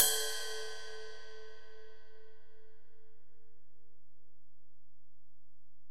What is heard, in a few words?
Ride, Alesis DM-PRO, Drum Machines